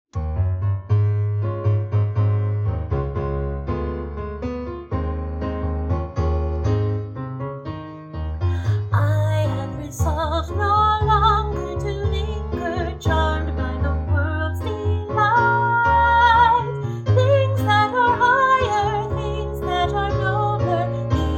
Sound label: Alto; I Am Resolved; Practice Recordings; Octavos